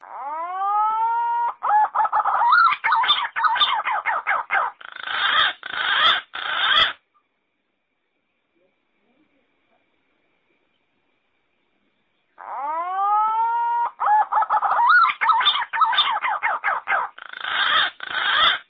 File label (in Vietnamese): tieng cu xanh mo quap keu